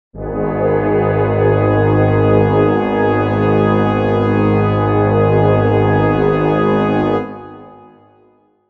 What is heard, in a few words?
brass chord g sharp minor